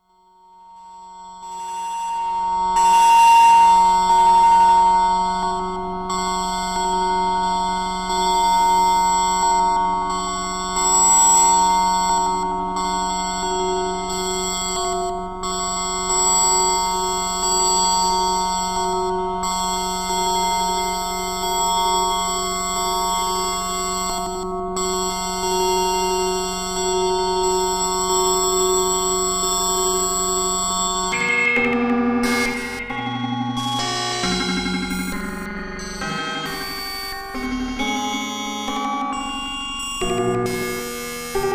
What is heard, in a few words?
acid sitar harp, evolver